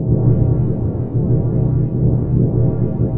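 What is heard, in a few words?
Ambience Sci-fi Energy Loop; SFX Kit; Sci-Fi Loops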